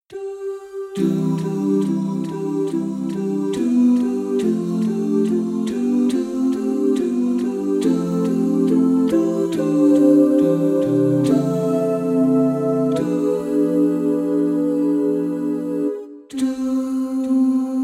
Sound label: Tenor